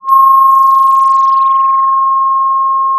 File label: Sound of a phone ringing